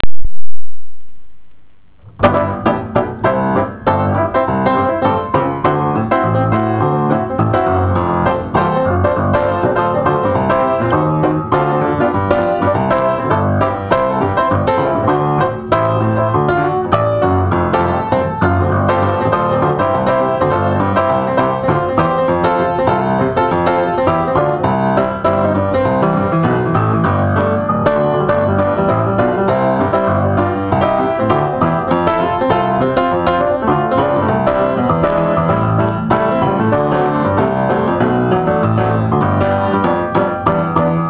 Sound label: Piano Composition